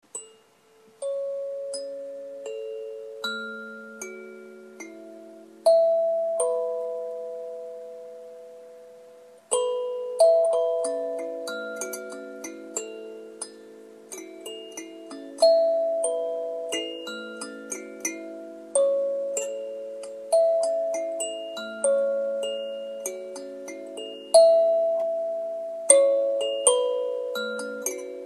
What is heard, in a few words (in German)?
Sansula Renaissance A Dur